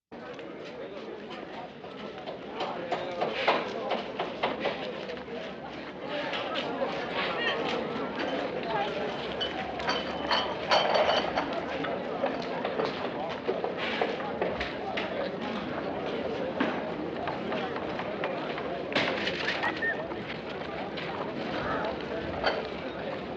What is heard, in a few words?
Street market in Marrakesh, ambience